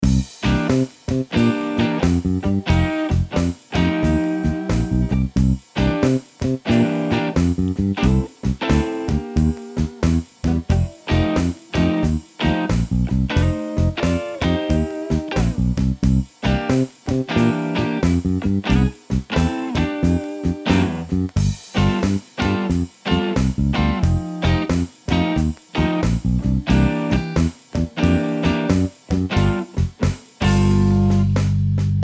Blues Licks Backing Track Loop; 30 Must Have Blues Licks

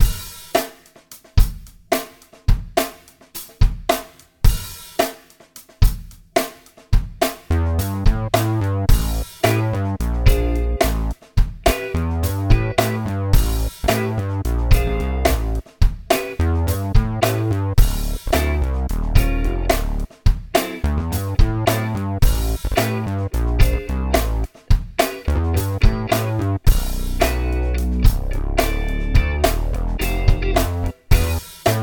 Backing Slow Tempo

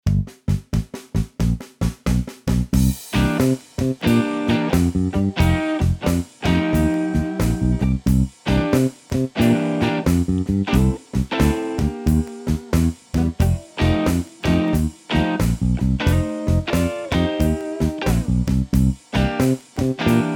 Blues Backing Track; 30 Must Have Blues Licks

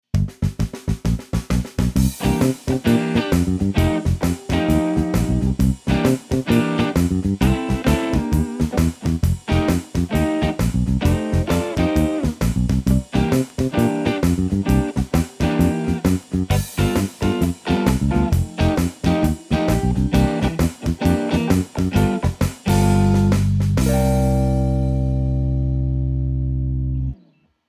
Blues Backing Track Full; 30 Must Have Blues Licks

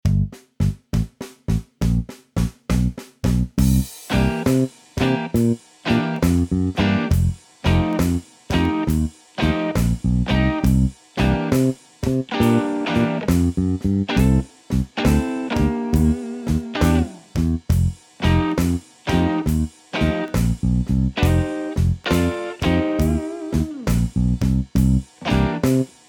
Blues Backing Track Half; 30 Must Have Blues Licks

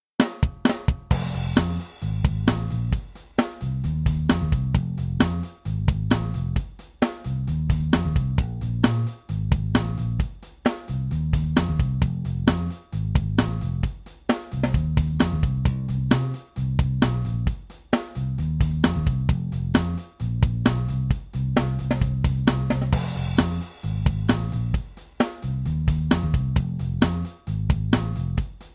Funk Groove in F rough Backing 132bpm

